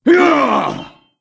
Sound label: Wound; Stormcaller Brundir